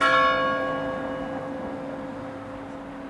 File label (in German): mooskirche glocke; spuren